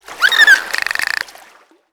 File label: Dolph mov Start